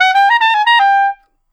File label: Clarinet Solo